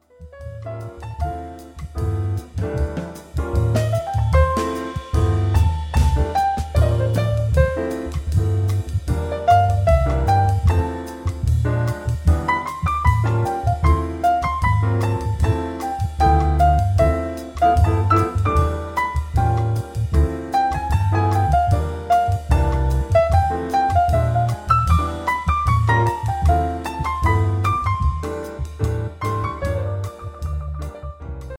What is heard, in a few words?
Spring Samba